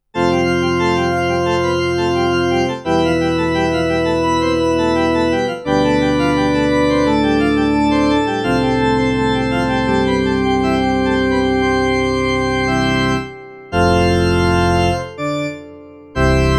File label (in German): CLP575 Orgel